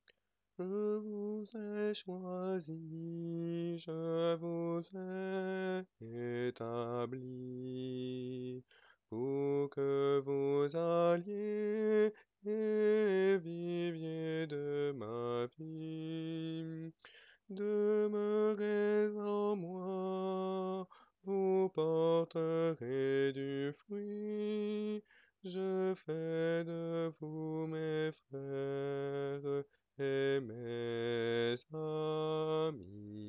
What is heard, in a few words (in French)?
basse; Je vous ai choisis; mariage